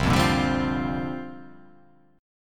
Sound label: C 7th Suspended 2nd Suspended 4th C down